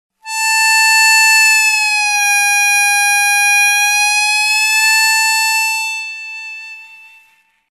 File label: bend, harmonica